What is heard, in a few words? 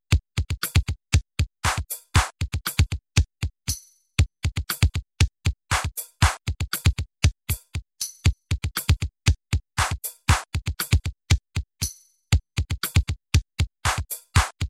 drum pattern